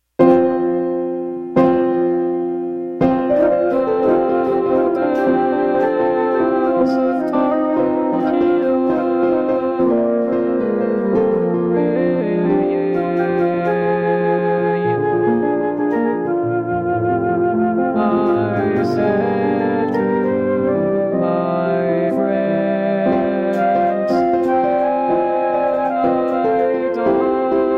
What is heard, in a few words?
Bells; Paroquia